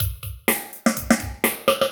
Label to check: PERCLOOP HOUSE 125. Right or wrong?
Right